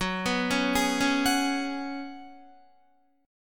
Gb Suspended 2nd Suspended 4th, Guitar, Gbsus2sus4 chord; arpeggiated; 13,14,13,13,13,14